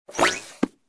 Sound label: rollover book blue; activity center; backyard; preschool time